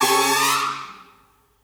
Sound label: Flashover